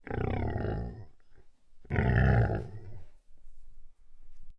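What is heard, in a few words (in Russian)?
akula shchelkaet zubami sovsem ryadom; jivotnyie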